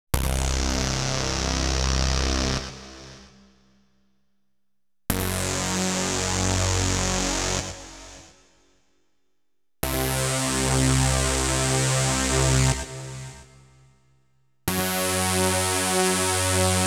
dance & techno, lead; Inspiration Zone

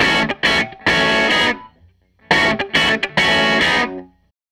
SG Guitar Loop

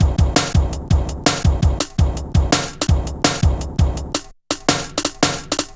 Bouncy, Jungle, Drum Loops 166.5; Zero-G Jungle Warfare 1, 2 & 3

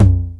909 HITS; SINGLE HITS; Megabass Remix! 2

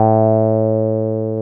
303 HLLW A2, ROLAND TB303; Big Fish Audio; First Call Vintage Keyboards

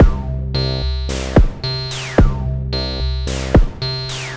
MODU C, Modular Synth Loops

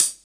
HH, Drum Dry; East Collexion; Drum 1 Dry

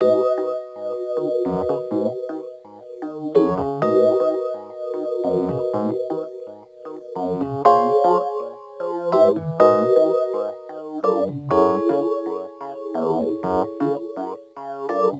Rhodes Attacks; Ueberschall

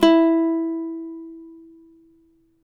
CAVAQUINHO E, Guitars

